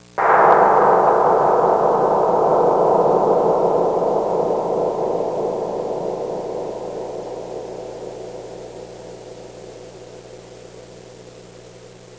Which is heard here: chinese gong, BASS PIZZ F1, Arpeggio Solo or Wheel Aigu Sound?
chinese gong